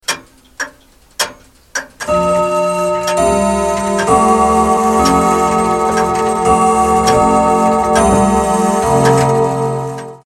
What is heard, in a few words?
big clock alarm ringtone